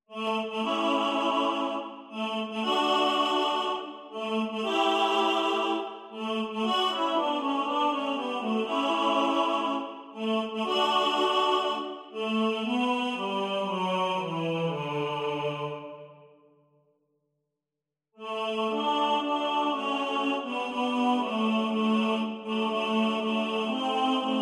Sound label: TENOR